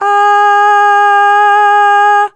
Aah Short G#3 Dry

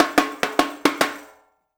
FILL, Percussion

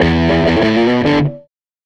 SG Guitar Loop

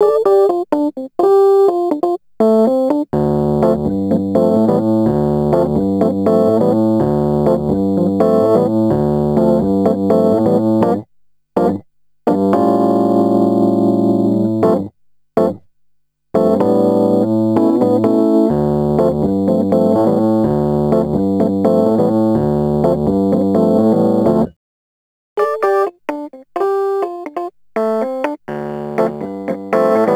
Rhodes Attacks; Ueberschall

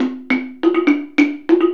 Slit Drum; Sonic Foundry (Sony Creative Software); Essential Percussion; Drums

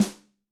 Snare, Yamaha DTXpress-II

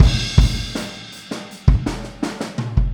Boogaloo Fill Kick, 82 BPM, Drums; Sonic Foundry (Sony Creative Software) - Drums from the Big Room The Mixes

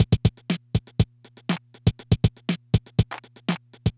Loop, dd-5

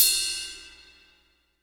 IMP CYM CRASH, Cinematic Impact

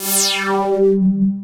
FAT BUGGR, BASSES, Synthsonic; Pro-Rec - Synthsonic

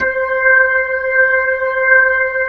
B-3, Jazz

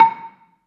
BELA2 A4, BELAFON, Asian Traditions; USB Soundscan vol.60; AKAI

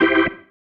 Stab, Organ, One Shots

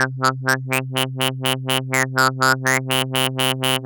Synth

